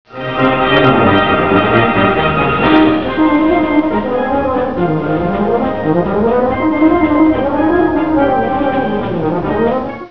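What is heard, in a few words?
euphonium sound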